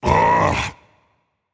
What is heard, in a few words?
ugh rage; chaac